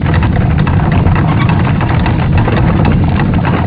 windmill; Hexen 2; ambience

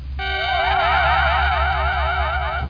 flash

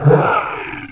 growl; duke nukem total meltdown

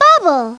bubble; Treasure Galaxy

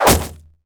arrow

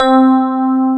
E Piano; cubic

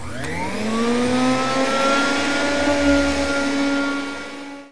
vacuum

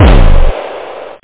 clap

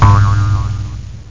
Boing

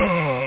urrr!; charley cat down by sea

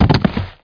body fall; MOTS

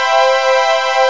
funk maj7

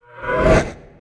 fly by

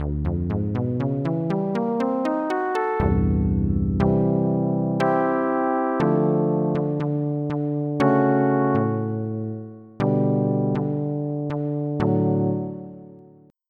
Synth Bass 1; FF7

